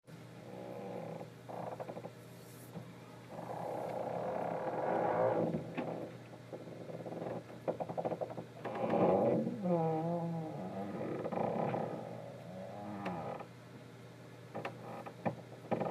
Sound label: wind